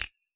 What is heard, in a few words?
Snap, Yamaha DJX